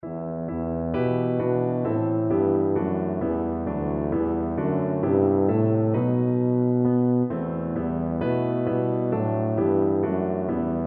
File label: god rest, tuba